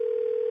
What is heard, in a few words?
windows ringout; operating systems